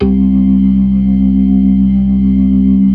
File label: ORGAN, 4 DRAWBARS; Keith Emerson Signature Series